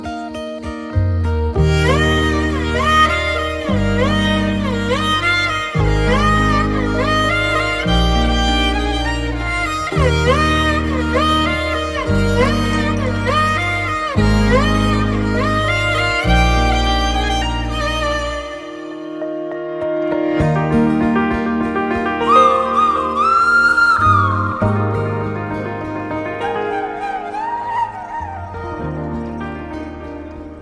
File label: Violin BGM; Anugraheethan Antony